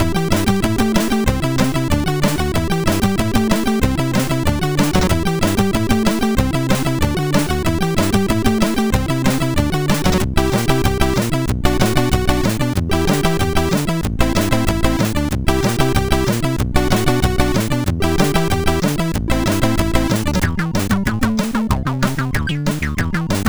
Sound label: sid, MOS6581R4